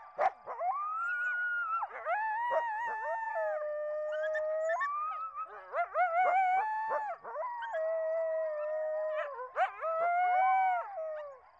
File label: Canis latrans; voice